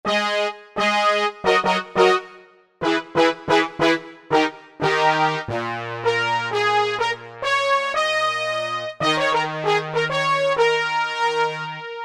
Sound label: Hybrid Bones